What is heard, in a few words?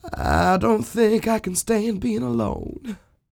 SING W, Vocal Planet Blues & Soul, BLUESY; ILIO